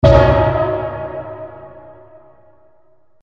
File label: crystal; the monster plane